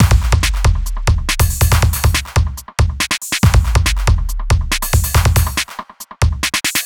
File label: hard beats 140, hard trance beats